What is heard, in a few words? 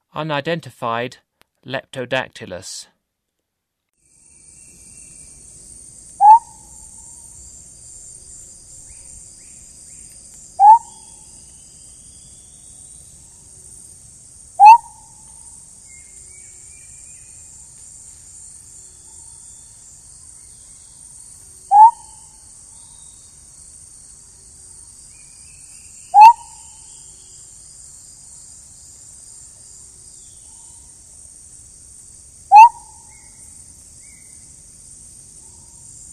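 Leptodactylus species, Frogs of Ecuadorian Amazon; S. America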